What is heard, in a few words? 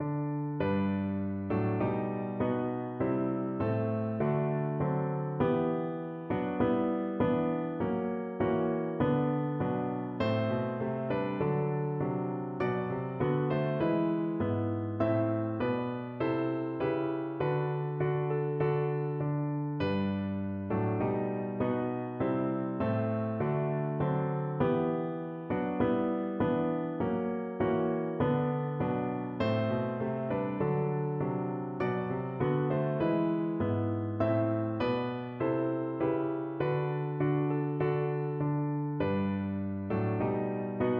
piano